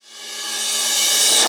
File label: Reverse Cymbal; Vengeance Samples; VEH1 Cymbals